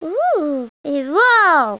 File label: wall